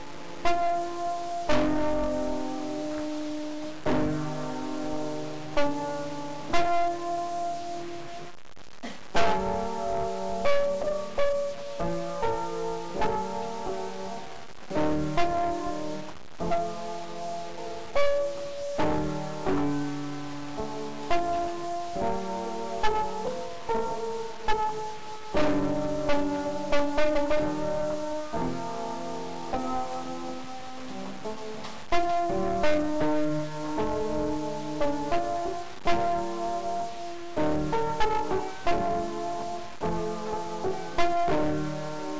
musica

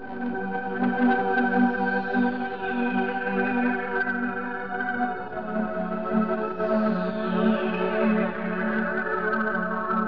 orgao

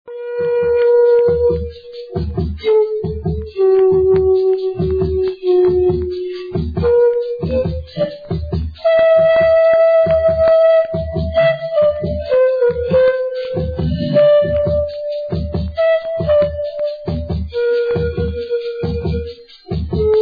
flauta de pan

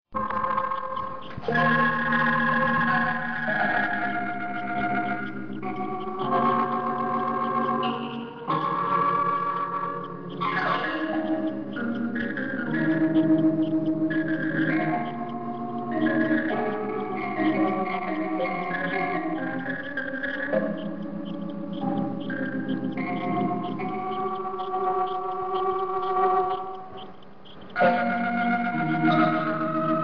orgao

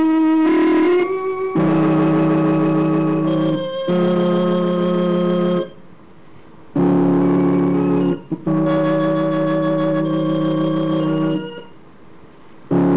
orgao

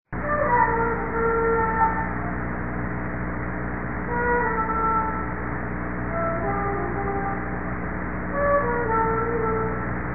flauta

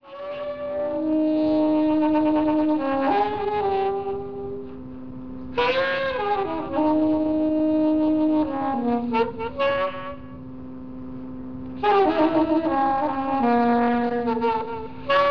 sopro

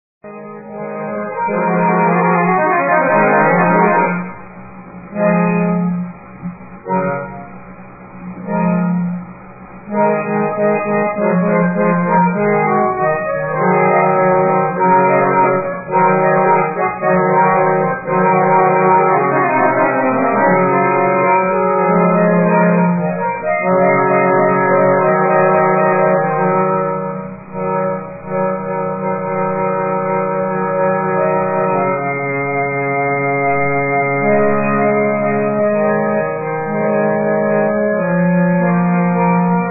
gaita

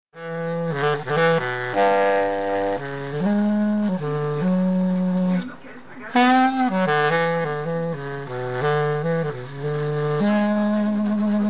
sopro

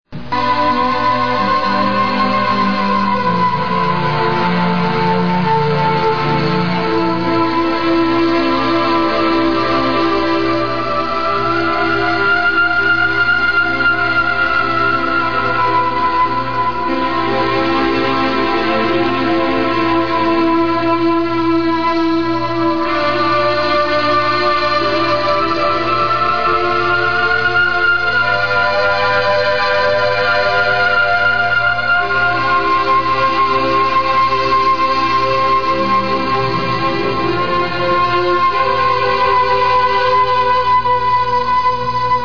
orgao

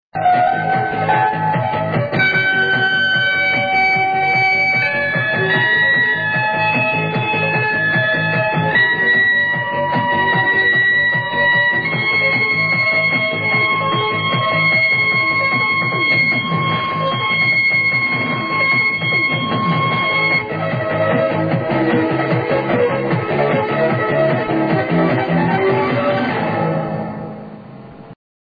rapidinha

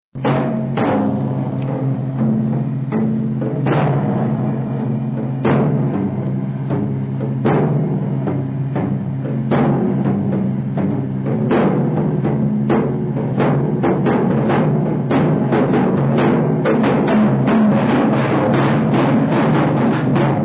percussao

